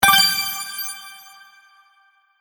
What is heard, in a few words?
Sparkle Collect Win, video game sound effects